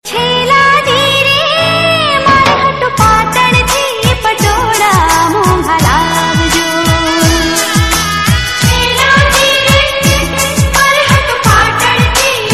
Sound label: gujarati; ringtone